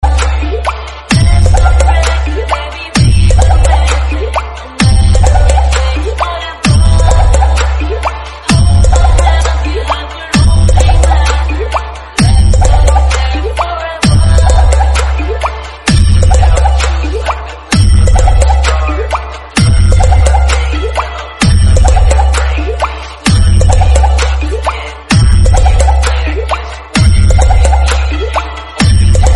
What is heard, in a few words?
Dj Song; TikTok